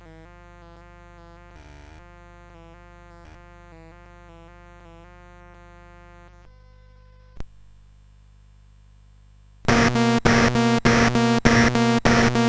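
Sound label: Drums and Stuff, MOS CSG8580R5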